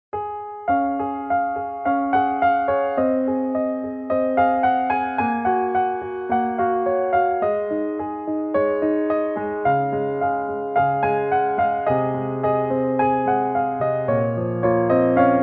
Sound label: Piano studio